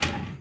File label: Drawer Hit; Soundeffects